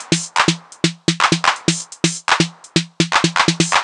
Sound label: drum loop ohnine top 125, Classic Chicago House; Sample Magic; Drum Loops